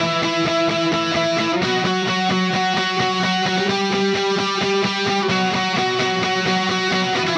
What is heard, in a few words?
Guitar, Indie Rock; Sonic Foundry (Sony Creative Software); Indie Rock Anthems; Session 10 - Roswell